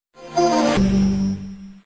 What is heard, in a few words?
Shutdown; Windows PDA 2001; Windows Never Released Soundpack 1; deviantart